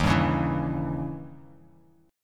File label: D sharp 7th Flat 9th, D sharp, down; strummed; JGuitar